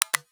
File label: Unlock